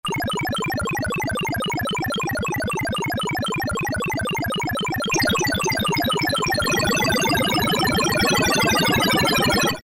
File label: BarberPole, E-mu Proteus-1; Presets